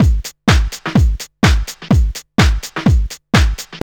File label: house loop